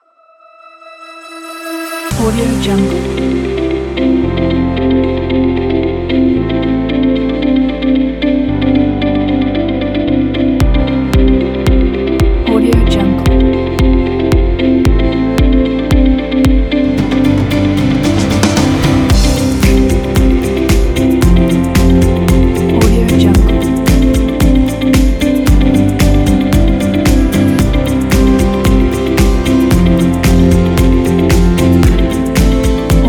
corporate inspiring uplifting motivational upbeat

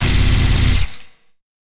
future weapons submachine gun burst; future weapons 3; soundmorph

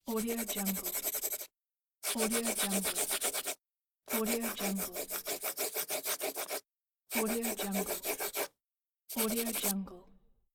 the pencil

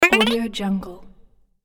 arcade game up bleeps

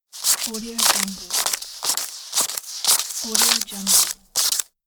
cash banknotes counting money

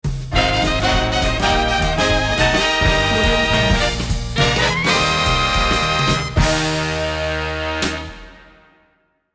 swing variety show ender logo; sound-ideas

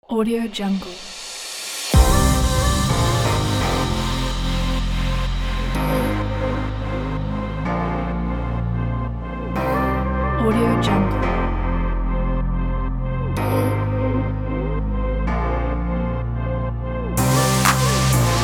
upbeat tropical deep house

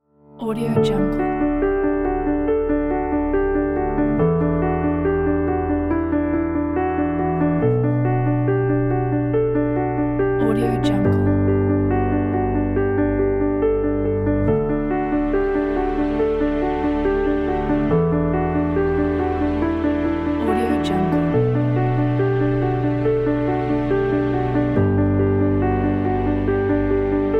piano inspiring; bluewhalemusic

